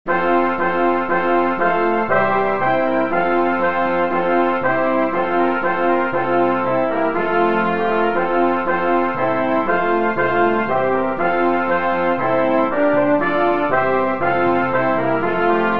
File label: Hymn